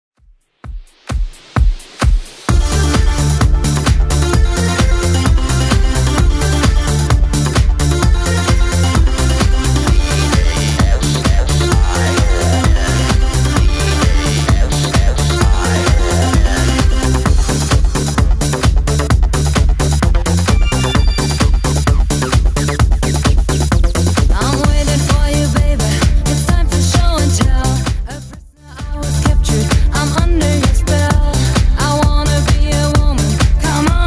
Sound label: 90s Redrum 130bpm